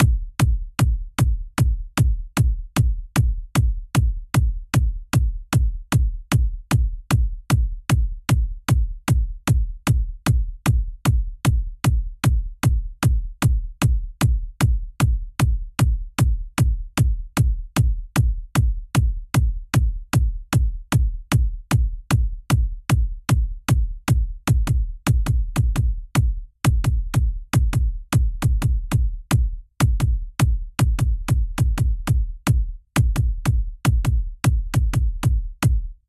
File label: bgm coin kick; Pac-Man Championship Edition 2